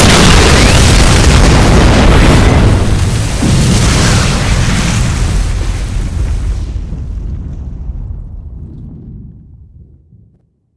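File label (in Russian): бурный разрушительный взрыв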